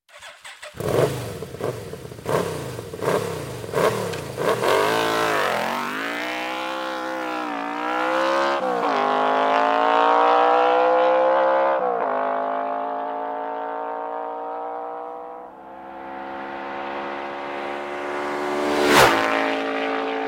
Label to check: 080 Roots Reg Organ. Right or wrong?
Wrong